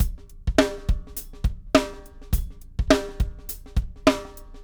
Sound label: Funk Groove; awe32